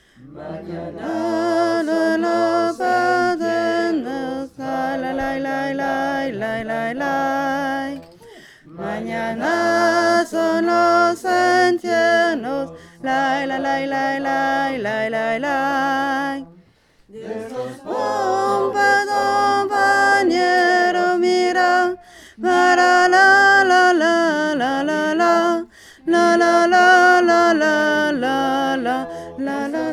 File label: SOPRANO